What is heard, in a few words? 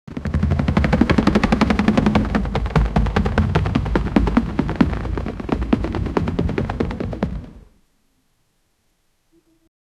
loud thumping running sound, physical